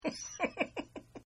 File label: Giggle Male; Laughter; Voice; Partners In Rhyme